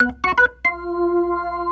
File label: Organ Lick; Ambient Grooves; Organs Licks